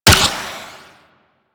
Flare Launch; DE; Warframe; Weapons; Archwing; Powers

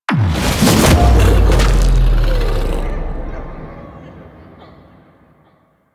Finisher One End Success; Warframe; The New War; Archon; Amar; Finishers

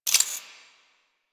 Moa Ability Fail; Warframe; Quests; The New War; Veso

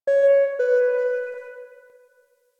Hub Screen PA Bing; Warframe; Ambience; Tenno

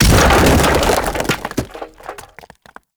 Tree Circle Planter Large Destroy; Warframe; Ambience; Nineteen Ninety Nine

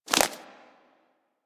foley idle ear flap; Warframe; Catbrow

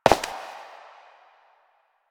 Step; Warframe; Koumei

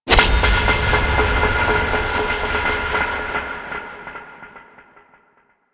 Cave Figurine Assembly; Duviri; Teshin

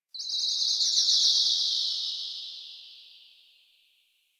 Forest Distant Bird; Warframe; Grineer Forest

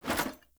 Foley Action Armour Melee Swipe; Player; Deora

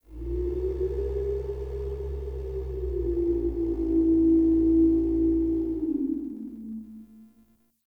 Cave Creature; Glades Of Andurin

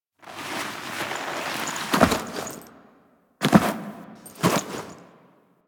Foley Step Turn; Parvos; Warframe; Parvos Lich Intro

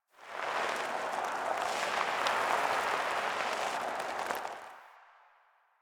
Tire On Cement One Off; Warframe; Ambience; Nineteen Ninety Nine

